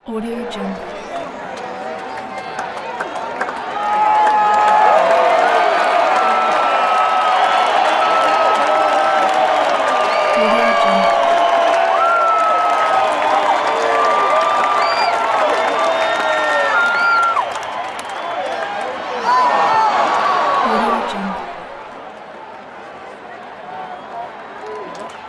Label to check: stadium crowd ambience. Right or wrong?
Right